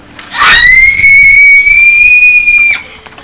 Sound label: Screaming